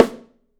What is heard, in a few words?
Drums, MARCHING; 3D Drums; OP MARCHING